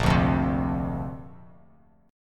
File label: A sharp Major Add 9th